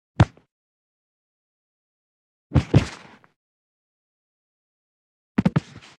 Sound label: Various Body Falls Onto Carpeted Floor; Fights Bodyfalls